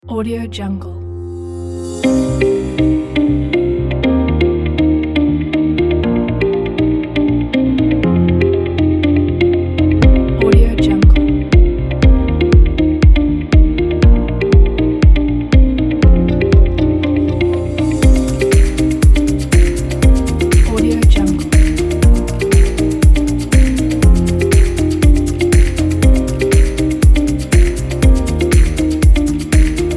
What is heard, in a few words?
advertising upbeat